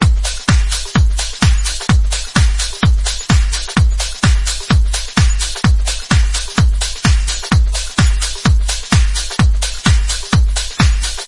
drum sample

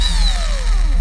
shutdown

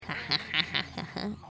naughty laugh

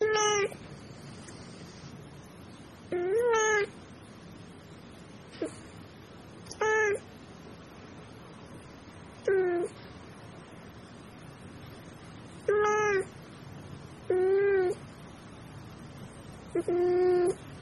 cat cry; guangdang

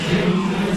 rim rock

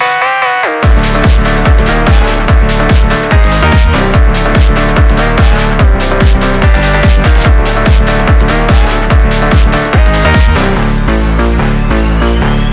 beat

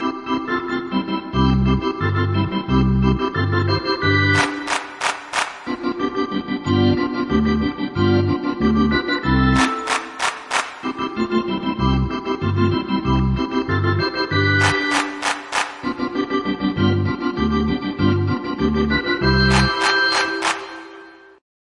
piano

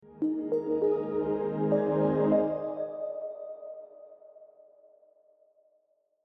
startup sound soft

